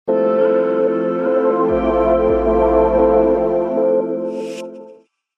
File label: Soundlogo Ambient